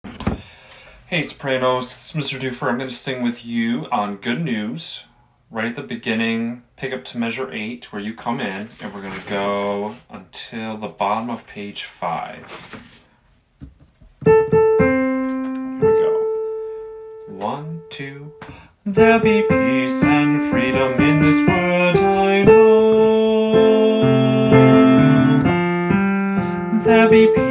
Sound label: good news soprano